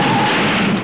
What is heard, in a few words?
Car